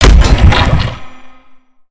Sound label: Spider Die Hit Goo; creatures mechanical; Lego